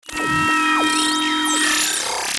Stinger Buzz Short Delay, Stinger Tuner Frequency Warble; Production Element Imaging Element Accent Transition